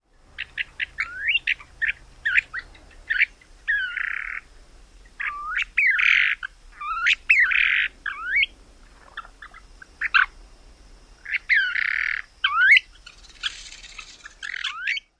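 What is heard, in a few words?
SNOPLO more calls, snowy plover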